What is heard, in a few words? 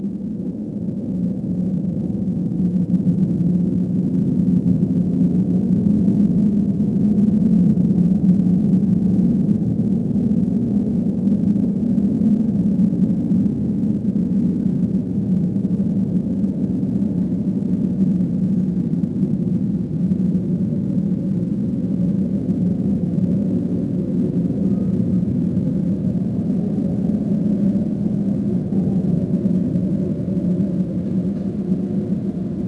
underground bunker, noise; Max Payne; XBOX